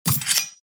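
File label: KATANA SWORD normal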